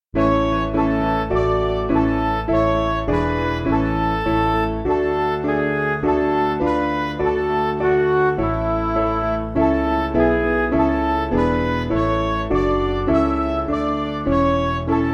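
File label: Music Backing Files for Churches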